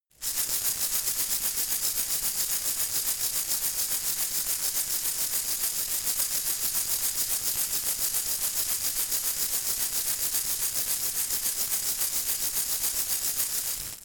insect shaker rattle slow

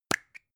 plastic spray can small open

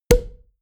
pop

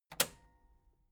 metal button cassette stop push

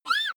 child shriek

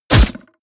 crossbow hit generic

